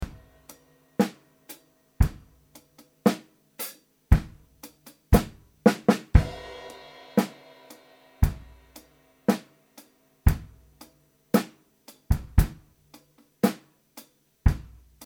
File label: drum sample